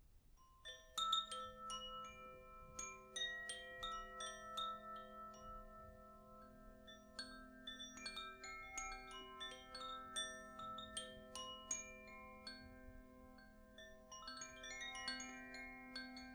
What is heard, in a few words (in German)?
erde koshi klangspiel